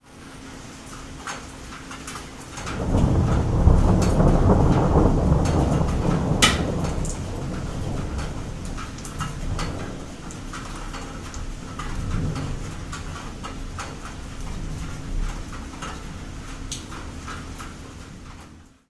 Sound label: rain thunder